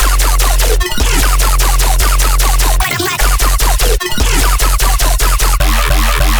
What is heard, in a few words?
bass loop trapping 150 F; Virtual Riot